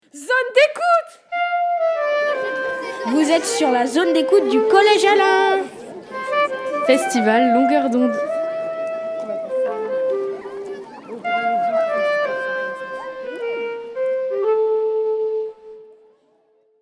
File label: jingle